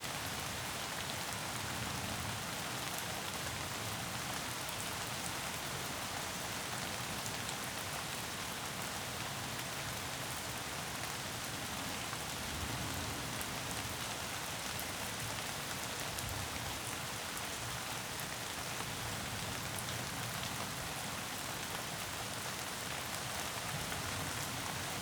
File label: Heavy Rain and Lite Thunder in forest